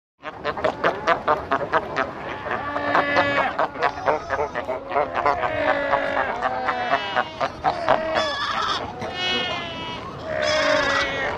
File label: Seal Cape Fur Barks Sq